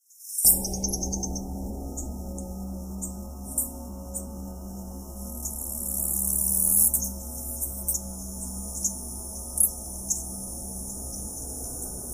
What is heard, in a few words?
Sound forest; PKokoras